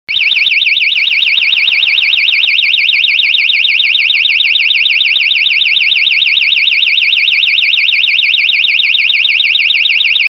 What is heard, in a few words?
PIEZO SIREN